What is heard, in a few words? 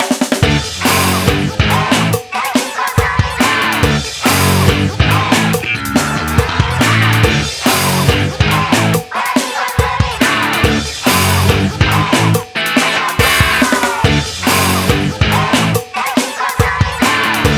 Victory!; Splatoon 2 Global Testfire; switch gamerip 2017